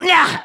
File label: kiai; MonsterHunter; Fight